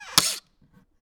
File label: Part Assembly; TOOLKIT vol.2